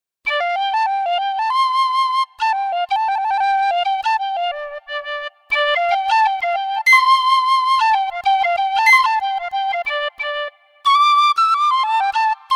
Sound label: Penny Whistle; montage; flutes